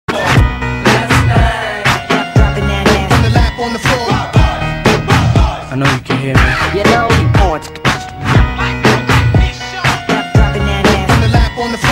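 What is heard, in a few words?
hip hop party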